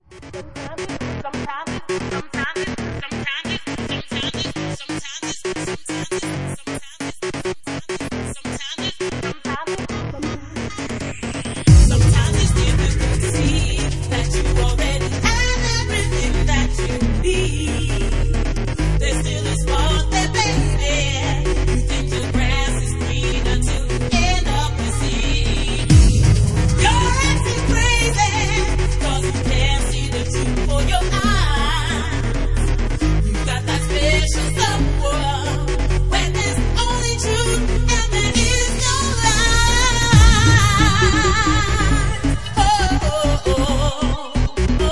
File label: Organ Mix